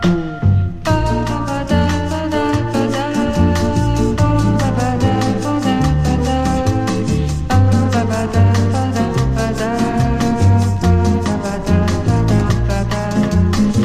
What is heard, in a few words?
BEACH SAMBA BOSSA NA PRAIA